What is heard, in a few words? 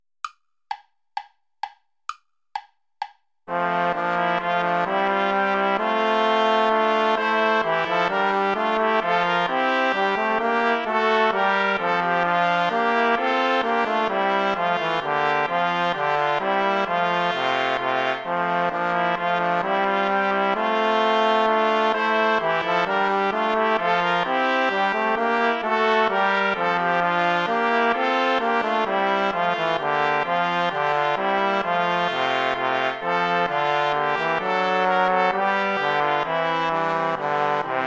play along without trombone; praetorius michael ballet des feus